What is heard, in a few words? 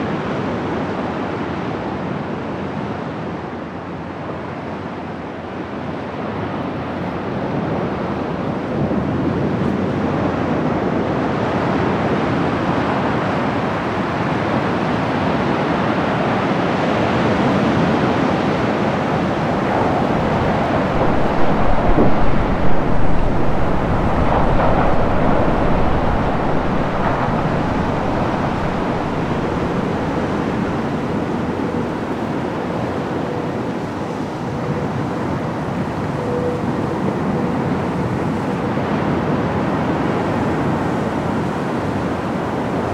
Beach ambience